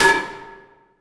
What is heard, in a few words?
Sweep Oneshot; Sonic Foundry (Sony Creative Software); Esoterik Beatz